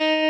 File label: Arp. clean